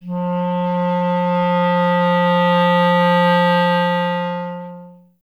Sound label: clarinet F